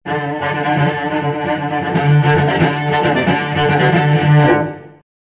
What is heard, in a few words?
Cello Danger Excitement